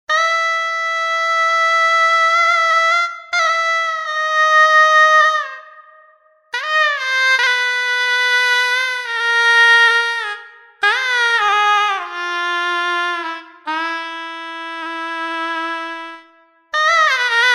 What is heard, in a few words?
shehnai basant